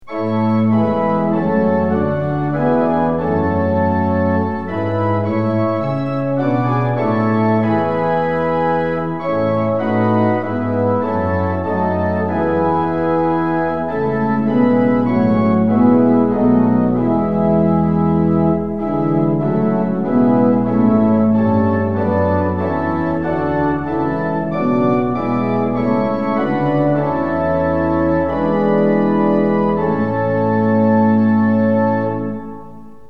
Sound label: tune; hymns; lillenas